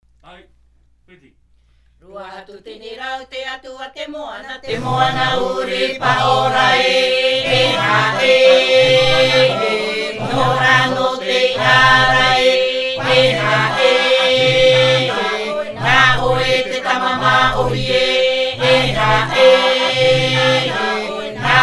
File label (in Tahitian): Himene tarava Raromatai